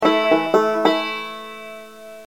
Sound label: Banjo